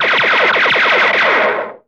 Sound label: cartoon rapid shots